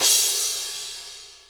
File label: crash, virtual drum sounds